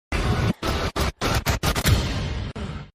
Personality Switch Sound Effect; Moon Knight

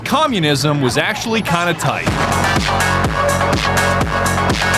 phonk; TheRussianBadger

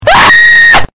Flamingo screaming

